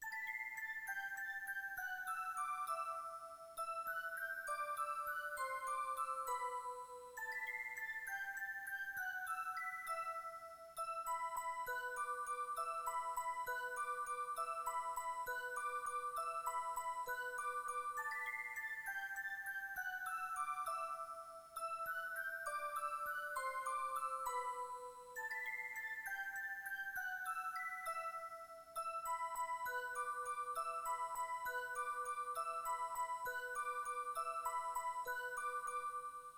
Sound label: Musicbox; Ultima